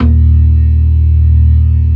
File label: ORGAN SYNTH; InVision Interactive; Keith Emerson